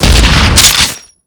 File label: shotgun fire; varia cc0